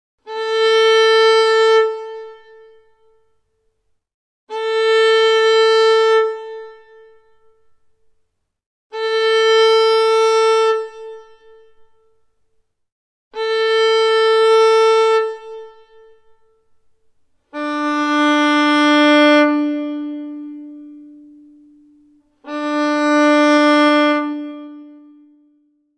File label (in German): stimmtoene